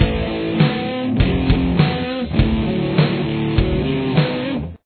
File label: chorus guitar